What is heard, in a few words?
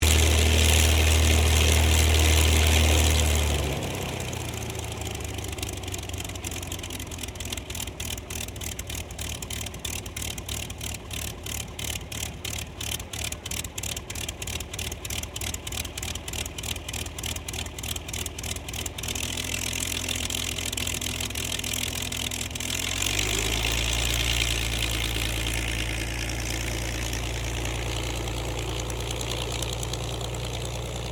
AIRCRAFT P-40 KITTYHAWK TAXI; P-40 Kittyhawk / Warhawk / Tomahawk; Airplanes; Vehicles